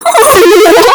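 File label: goofy ah bhuhuhuhuwahahaha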